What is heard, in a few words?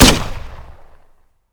grenade